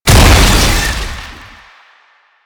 Technocyte Explosion; Enemies; Lasrian Tank; Warframe

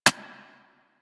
Sentient Brachiolyst Step Default, Steps; Warframe; Sentients

